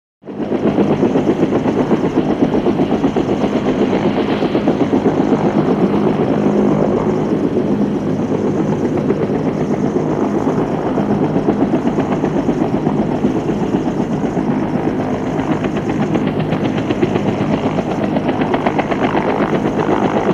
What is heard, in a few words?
Helicopter Hover, jet ranger